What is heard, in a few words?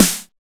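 909 SNARE; Drums In Your FACE!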